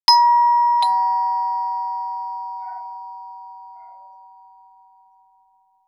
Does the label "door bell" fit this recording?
Yes